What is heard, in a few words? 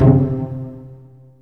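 cello pizzicato C2, Virtuoso Strings; ILIO; Kirk Hunter Virtuoso Strings VOL-3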